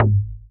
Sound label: C Bass